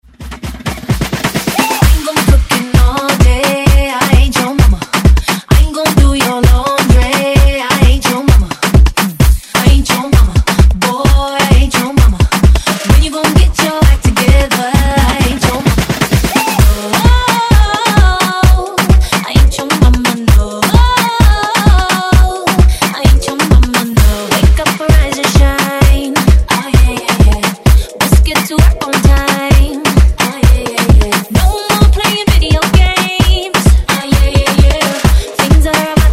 Afrobeat Redrum; remixplanet

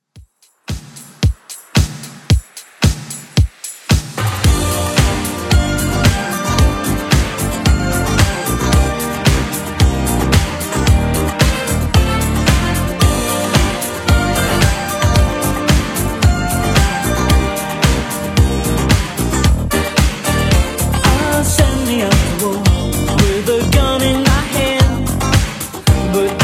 80s Redrum 112 bpm Clean

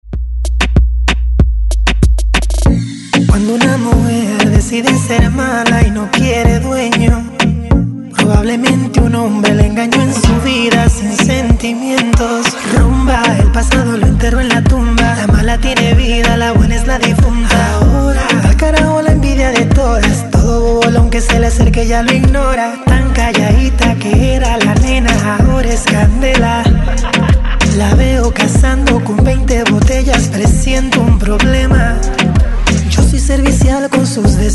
Intro Outro 95Bpm; DJDOLLS

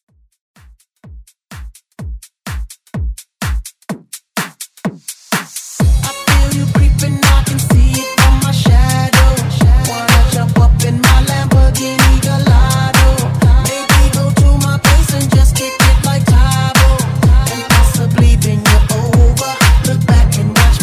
House Remix

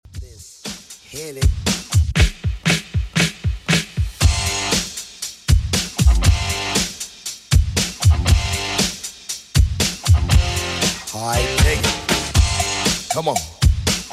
80s Redrum 118bpm; remixplanet

